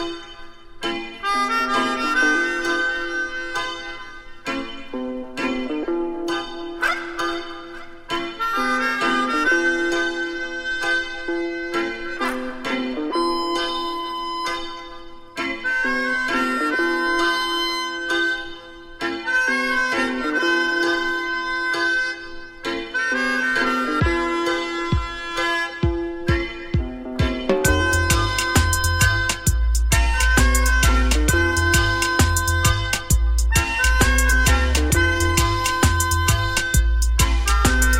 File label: melodica